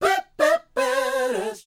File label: SHOO B, R&B Dance; Spectrasonics Vocal Planet